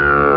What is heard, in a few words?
PING; Spanish Net; MAPAROO